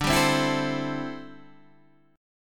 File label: D 9th Suspended 4th D down Guitar Open D